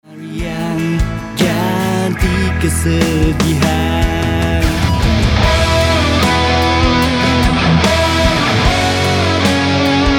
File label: Hard Rock